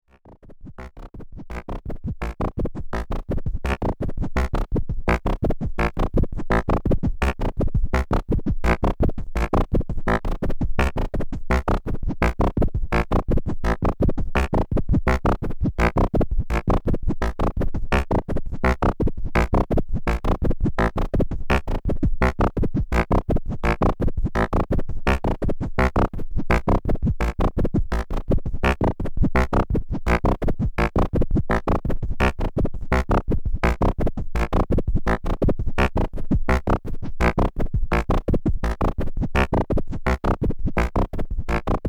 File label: Dub